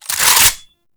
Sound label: AK47 Reload Chamber; Warframe; Tenno